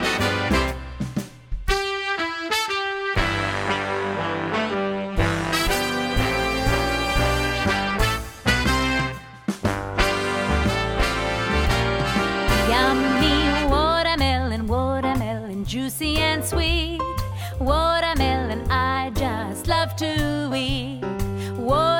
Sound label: Jazz